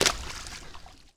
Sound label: water land slow shallow